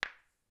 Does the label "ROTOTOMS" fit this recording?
No